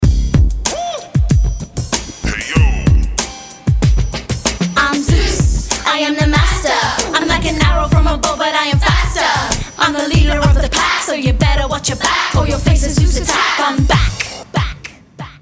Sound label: Zeus Rap